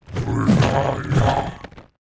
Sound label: BLAZE BLOCK WAR ATTACK